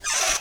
Panel Electrical Wires Open; Among Us

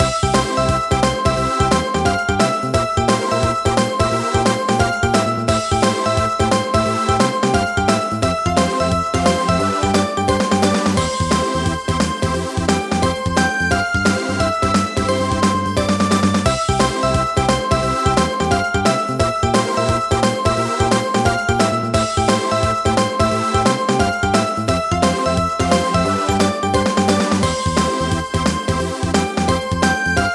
BGM Game; Kuma-mon Bomber Puzzle de Kuma-mon Taisou; 3DS

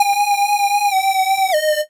Beat

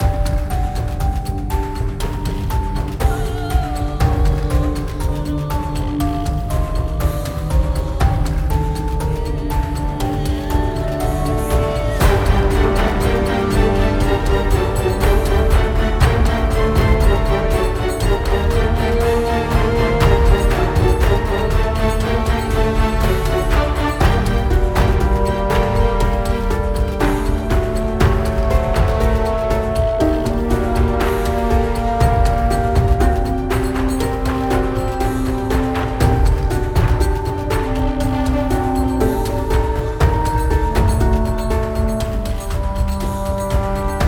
Action 120bpm High; project zomboid

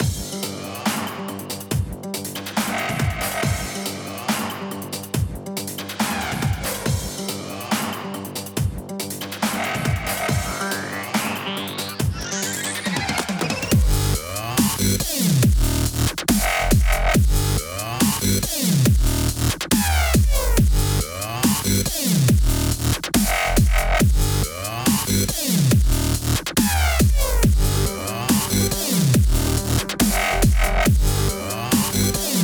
Dubstep Menu

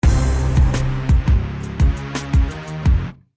Level Up

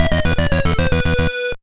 Game Over (Nes Version); Castlevania Harmony of Dissonance; GBA

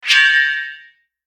Skill Xp dropped

